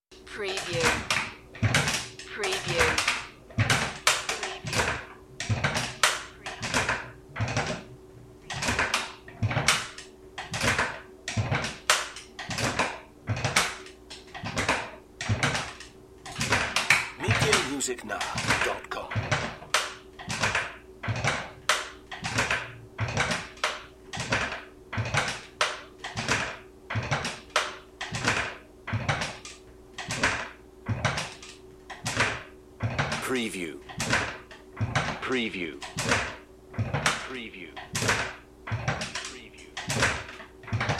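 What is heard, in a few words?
Creaky Rocking Chair; man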